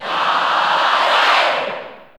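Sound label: Cheer Italian, crowd; Robin; SSBU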